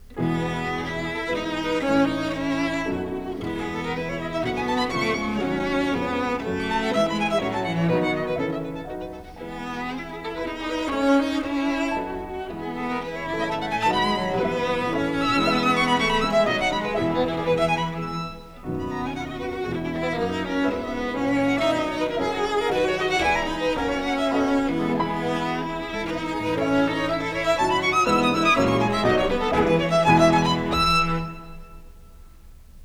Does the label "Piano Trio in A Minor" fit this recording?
Yes